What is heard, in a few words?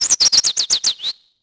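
ambience bird; Tony Hawk's Project 8; XBOX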